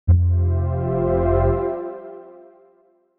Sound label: jingle; audioflair gmbh